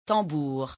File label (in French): tambour